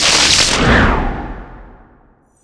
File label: spear draw, weapons